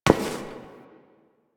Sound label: Step Metal; Warframe; Koumei